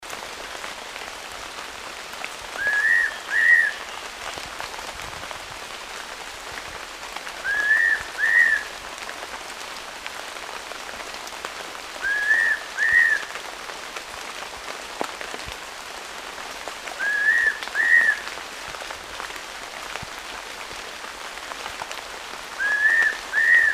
pitta nympha; kurtaczek blady; ptaki

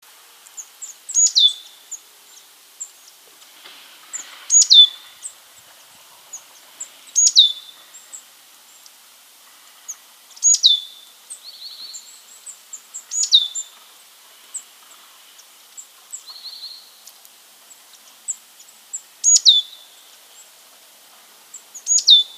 periparus rubidiventris; sikory czarnoczube; ptaki

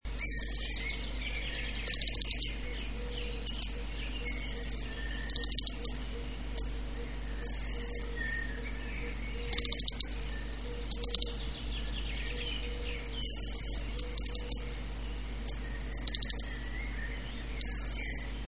erithacus rubecula, turdidae; rudzik; wroblowe

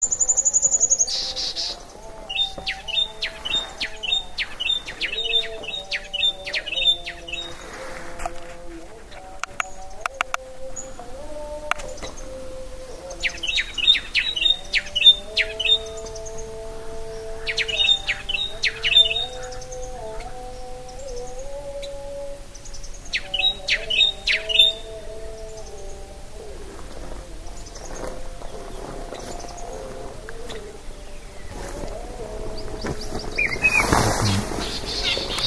parus leuconotus; Sikora jasnogrzbieta; ptaki

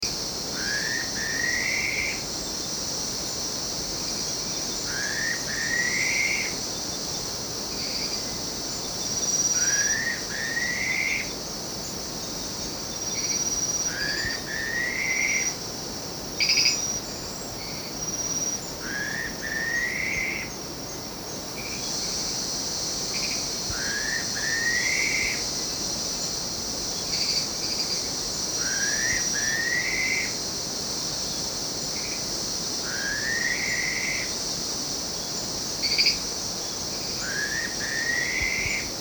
pitta anerythra; kurtaczek czarnolicy; endemitem na Wyspach Salomona; ptaki

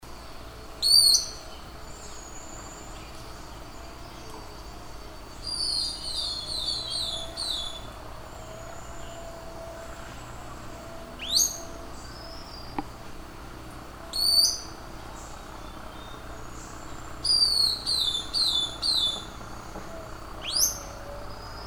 psarisomus dalhousiae; szerokodziób długosterny

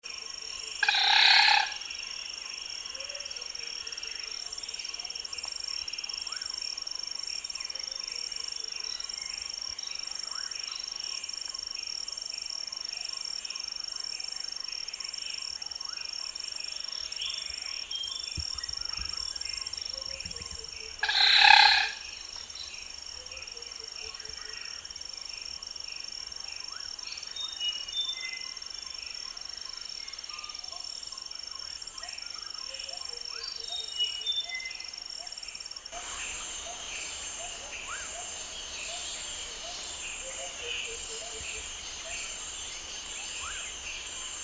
smithornis sharpei; czapecznik ciemny w czasie toków; ptaki

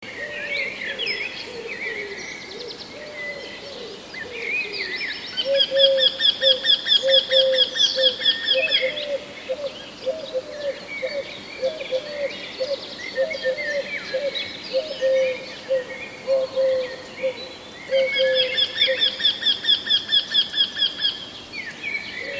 jynx torquilla; krętogłowowi; ptaki

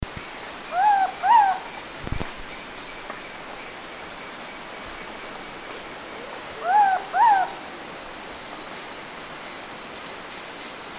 pitta superba; kurtaczek wspaniały; wyspy Manus w archipelagu Wysp Admiralicji; ptaki

